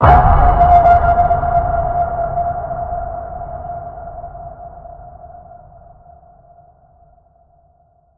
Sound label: Deep Waters Ping, F